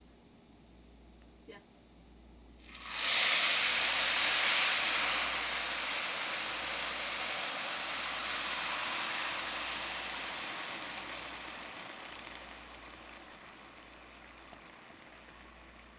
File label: Sizzling